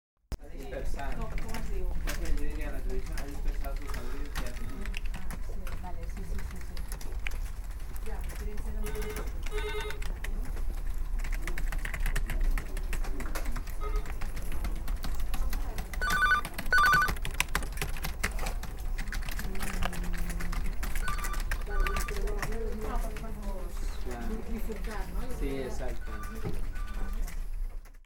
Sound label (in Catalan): Redaccio De La Vanguardia